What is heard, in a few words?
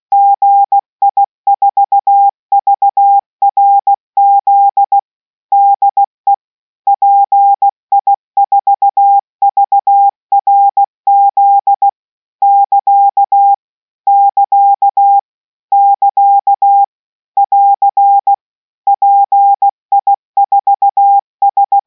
Morse